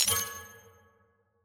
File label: team ptt click; premade voice